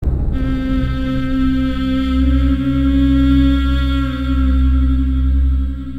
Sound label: Message Ringtone